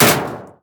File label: metal vent impact; physics